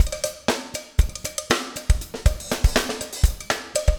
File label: breakout 120, 120bpm, loops